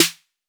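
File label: 808 snare drum; Electronic; Wizoo - Platinum 24 Electronic; CHR SD 808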